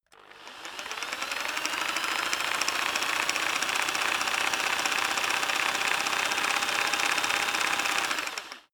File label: rock drill hammer speed up long soft; maschinen; bohrer; soundpool